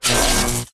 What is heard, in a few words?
lightning attractor charge; Factorio